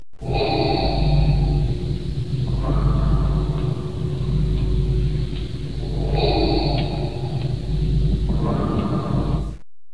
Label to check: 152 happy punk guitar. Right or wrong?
Wrong